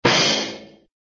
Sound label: crash; hannah barbera cartoon sound fx